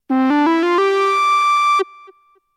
Breaks

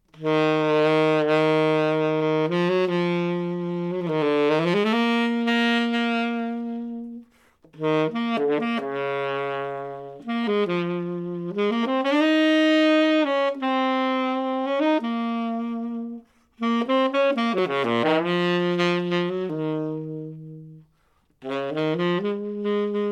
jazz tenor; pimentel select

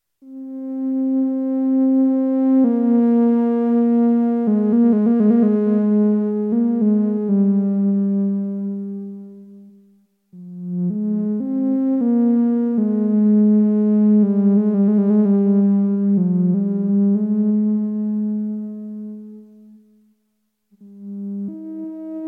flexible ewi fingerings

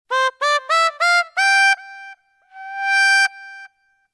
Scoops

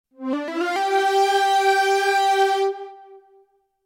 Detuned

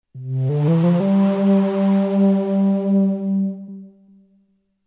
Breathy

